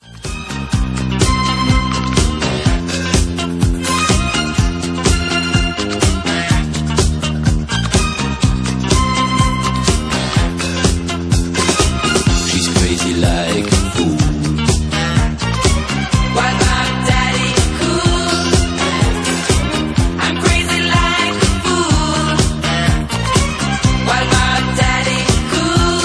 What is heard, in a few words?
mobile ringtone; mammookkas; daddy cool movie